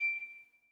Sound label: Rose Hover; netent